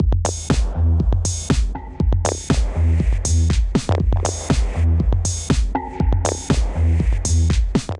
Genome Kit, Drums; Ableton; Punch and Tilt

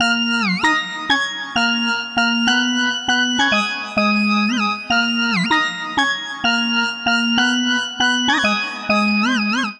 Organ Riff Eb Minor 101bpm; MIDI Clips; Melodic; Twisted; Ableton; Factory Packs; Chop and Swing

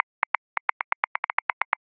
100 Bell Groove; Grooves; Ableton; Factory Packs

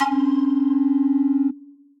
Circus Tricks, Percussive

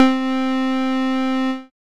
Poly Klavier; Instruments; Ableton; Factory Packs; Stray Cats Collection by Max for Cats; MSE; MSE Presets

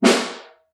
Snare Far

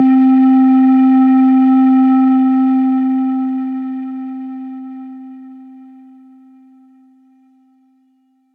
Drunken Moon Whistle, Playful Whistles; Instruments; Ableton; Factory Packs; Lost and Found

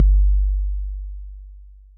Kick Mod 606; Drum Machines; Drum Hits

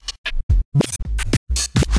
Broken Beat; Inspired by Nature by Dillon Bastan; Vector Grain Presets

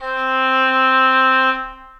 Oboe Solo Legato Vibrato; Ableton; Orchestral Woodwinds

